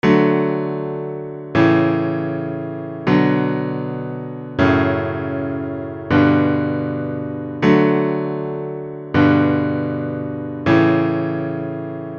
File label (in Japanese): カノン進行